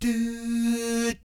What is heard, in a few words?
DOO, Vocal Planet Jazz